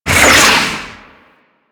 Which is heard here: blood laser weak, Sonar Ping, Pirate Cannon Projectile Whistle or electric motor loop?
Pirate Cannon Projectile Whistle